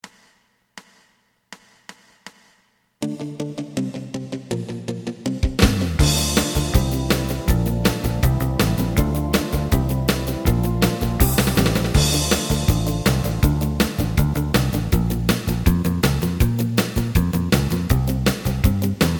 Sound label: lead